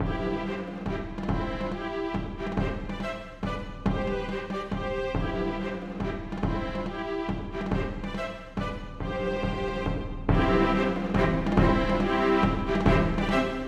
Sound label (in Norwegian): Menuet for strykekvartett og ad. lib. trommer; Aamodt